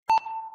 Turret Target

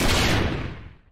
Landmine

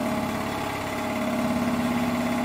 Piston

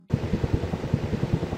Propeller Loop

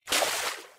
Splash

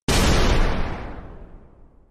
C4 Explosion

